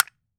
CASTANETS; AKAI S6000 CD-ROM - Volume 5

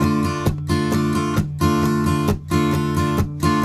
RIFF, Blues Guitar; ILIO; Fretworks

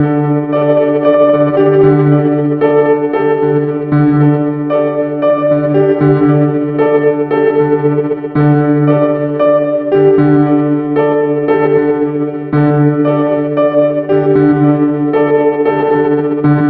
Piano; Drums & Drones

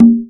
CONGA, CONGAS; Best Service; Dance Mega Drums CD2

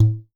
KAE KEEKE, HAWAII PERC; AKAI S6000 CD-ROM; WorldSounds

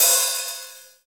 HH, Real

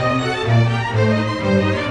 Strings; Sonic Foundry (Sony Creative Software) - Orchestral VOL-2

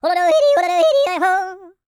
FAST YO, World Voices; ILIO; Vocal Planet

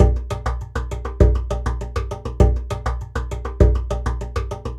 Fat Box, percussion; Sonic Foundry (Sony Creative Software); Joe Vitale Latin Percussion

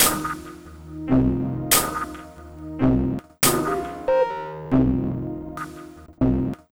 Steady, Synchro Funk, Beats; Sonic Foundry (Sony Creative Software)

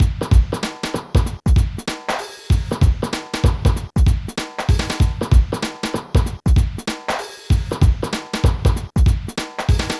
PHATT BEA, Hip Hop

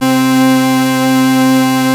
OB VOX C4, Synthesizer; Hollywood Edge - Giorgio Moroder Rare Synthesizer Collection

